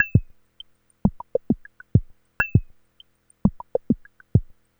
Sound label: Percussive